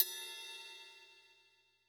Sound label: Ride; drum